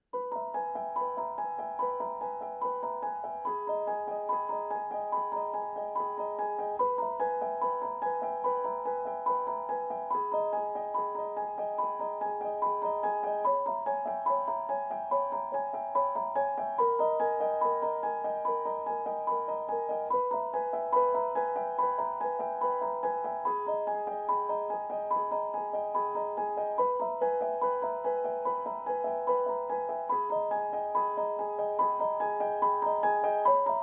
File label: little girl of rain backing track